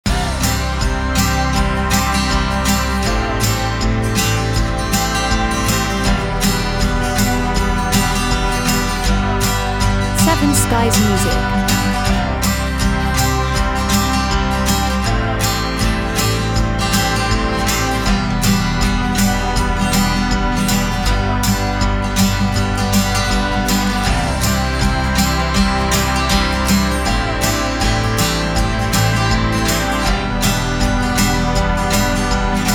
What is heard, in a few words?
Acoustic Love